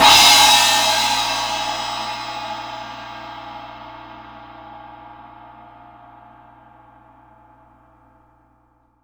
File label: CYM HIT; Sounds Good - Roots Reggae